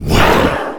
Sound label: ghost shoot